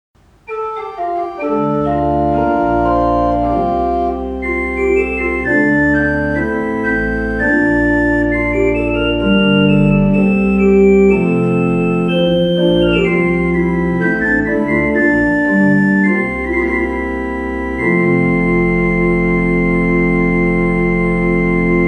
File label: Klais, pipe organ